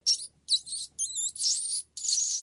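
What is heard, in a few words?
rat squeak short sound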